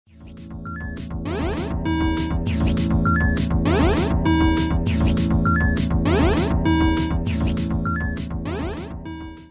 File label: Game Coin